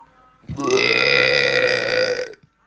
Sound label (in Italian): tosse grassa